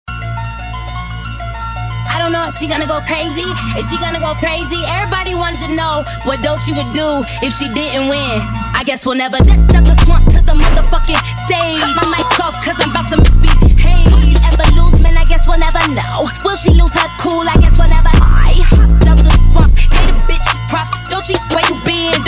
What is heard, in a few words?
Hip Hop; Adults